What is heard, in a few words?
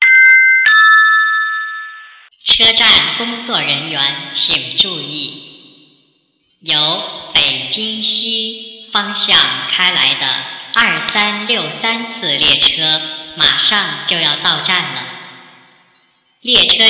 Horn